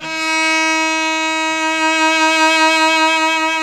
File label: Synclavier Strings; ILIO